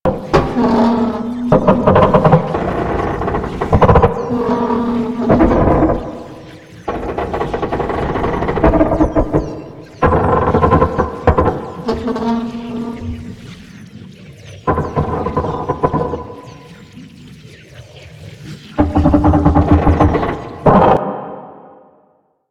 stuck truck loop; Aoi; Warframe; NineteenNinetyNine; Stage8ShitboxConvoy

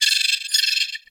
Valley Night Critter; Warframe; The New War; Drifter Camp Valley

